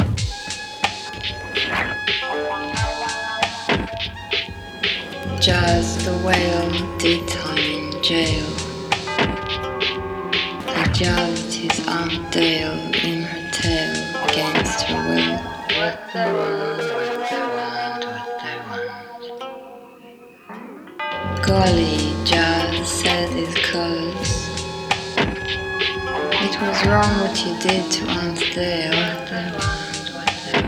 Jazz

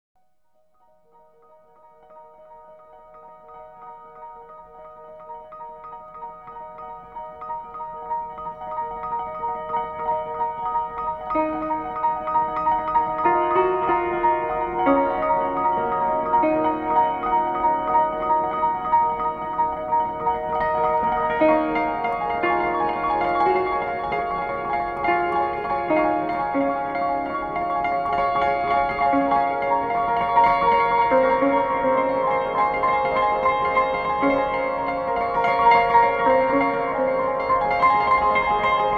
Piano; Sleepy River

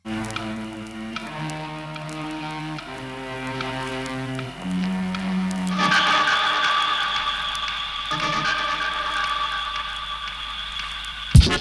Accordion

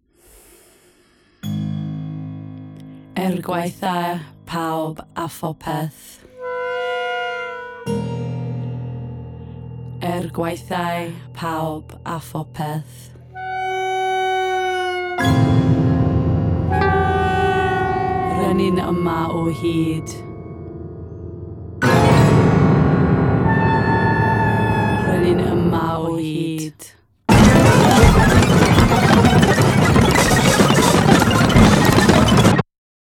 Fission for Drums, Piano & Voice

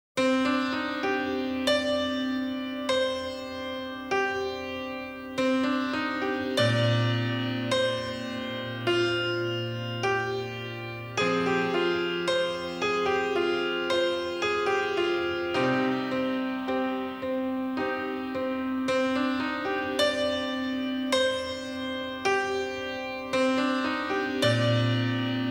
instrumental piano

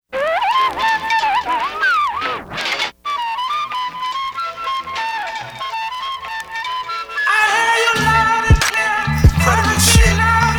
Indian Bells

